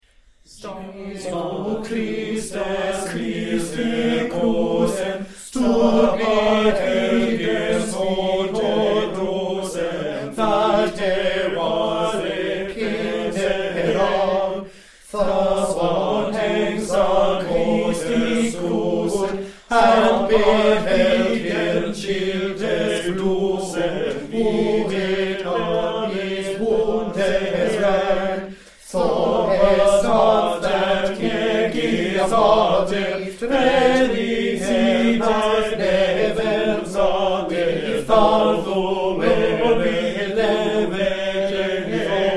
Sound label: medieval mashup